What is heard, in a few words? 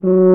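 F#4 FRENCH HORN; ORCH.COMP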